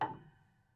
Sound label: Prepared Piano